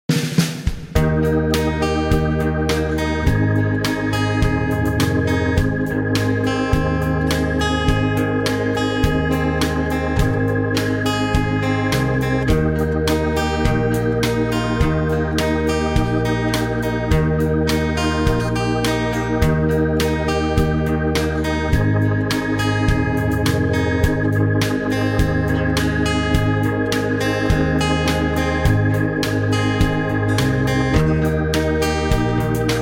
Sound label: Ab No Backing Vocals, backing tracks